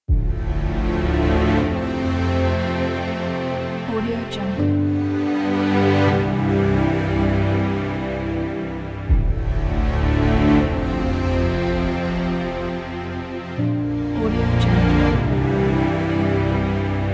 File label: nostalgic cinematic